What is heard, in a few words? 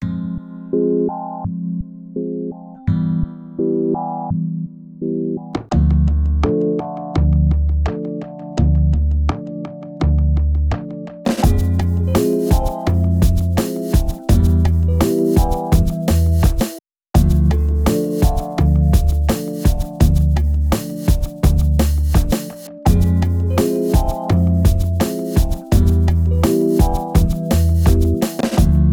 chord melo